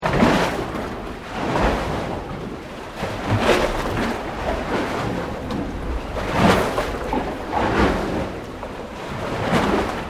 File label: Water Heavy Waves Under Concrete Wharf Loop; Elemental weather effects; Ocean Sound Effects; Nature